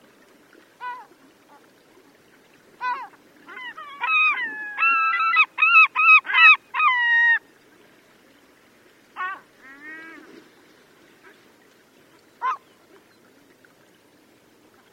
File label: vega gulls yelling